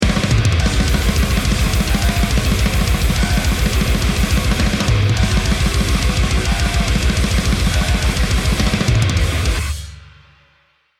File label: round ten sec count, music